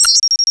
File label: ui arcade checkpoint; user interface